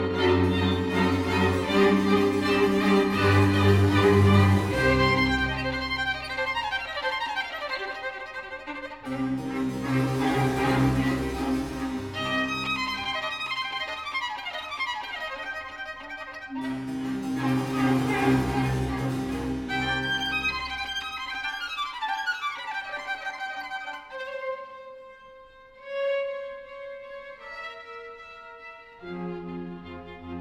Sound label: Violin Concerto in F Minor, Allegro non molto; Op.8 No.4; L'inverno